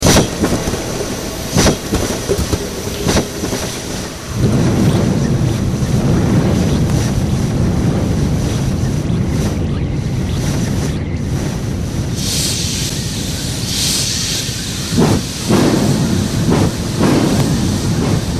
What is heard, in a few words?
HarmonixVox